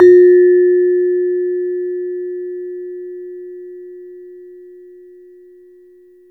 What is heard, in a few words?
SARON F 2, GAMELAN SET; Spectrasonics; Heart of Asia